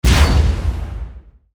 archer skill siegestance charge

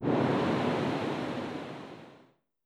weather Heavy; Seasons-Of-Loneliness

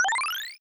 adrenaline rush; action RPG game

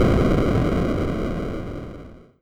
explode; Seasons-Of-Loneliness

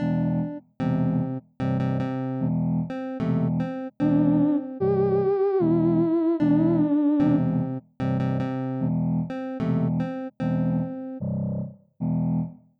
gameover; Seasons-Of-Loneliness

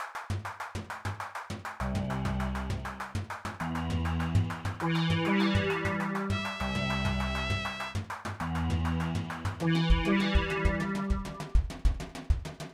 battle; PixelEngine

